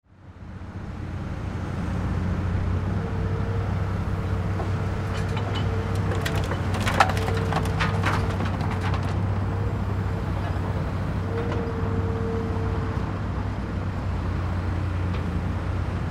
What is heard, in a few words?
Crane Load Dirt, Crane Load Dirt Into Truck Bed; Construction Vehicles; INDUSTRY; Blastwave